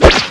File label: knife slash; weapons; cstrike